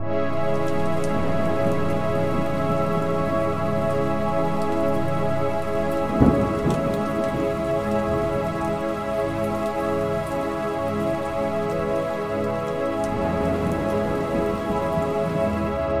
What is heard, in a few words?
Reflect, ambient pad